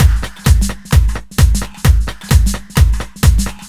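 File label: No snare, Drum Loops 130bpm; Zero-G; Soundclash Nu Dance Electro; Acidized Wavs; Klub 2 Death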